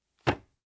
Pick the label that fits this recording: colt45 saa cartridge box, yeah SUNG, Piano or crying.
colt45 saa cartridge box